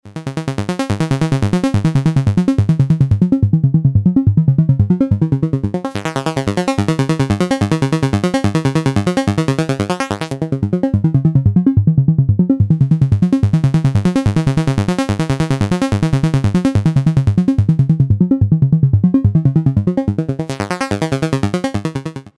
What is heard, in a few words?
morph basic